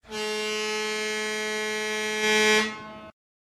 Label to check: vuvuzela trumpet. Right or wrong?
Right